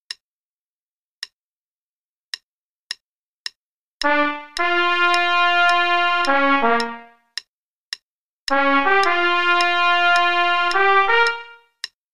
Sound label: Play-a-long, Blues, qn=108